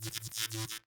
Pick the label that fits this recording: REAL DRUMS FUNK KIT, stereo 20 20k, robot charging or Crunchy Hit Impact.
robot charging